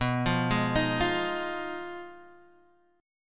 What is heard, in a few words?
B 6th Flat 5th B down Guitar Half Step Down